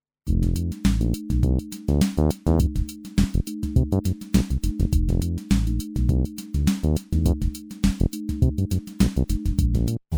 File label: EVO 8 Micro Modular